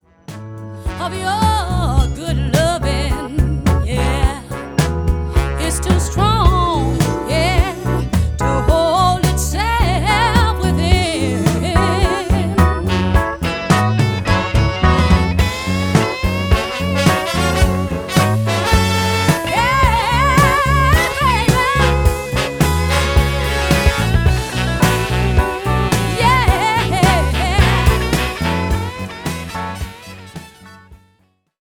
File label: soul female; ソウル 女性